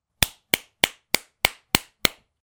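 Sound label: slap sound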